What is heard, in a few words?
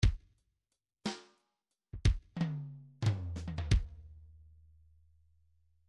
midi file fills 3 4 fill